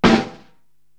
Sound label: SD Old Buzz Roll, Korg TR-Rack drum machine